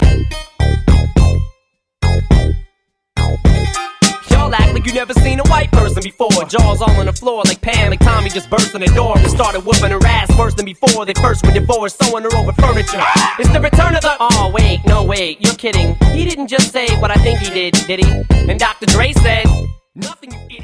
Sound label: 2000s Mainstream